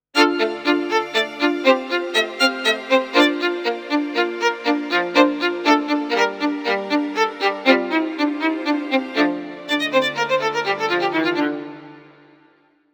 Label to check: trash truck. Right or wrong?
Wrong